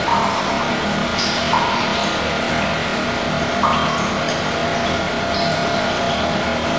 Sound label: hum drip; hl2